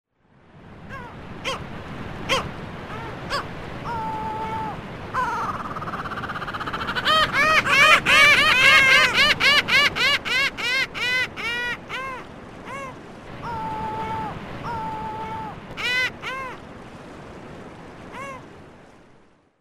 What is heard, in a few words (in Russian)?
dve temnyh chajki